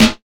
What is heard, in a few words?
snare sample A key; Duration 0:01; Samplerate 44100 Hz; Channels Mono; snare drum samples